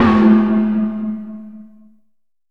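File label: FX CHINESE GONG, PERCUSSION; AKAI S6000 CD-ROM - Volume 5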